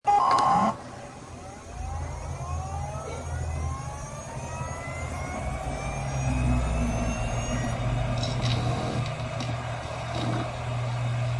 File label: bootup